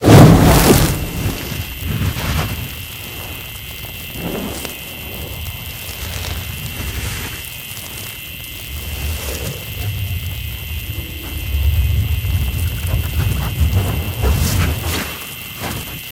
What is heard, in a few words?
Focus Fiery